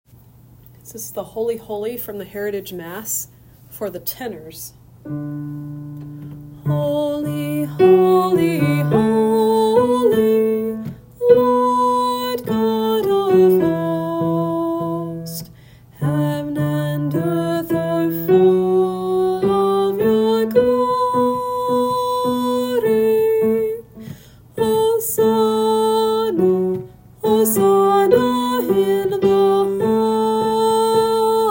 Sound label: mass tenor; Holy heritage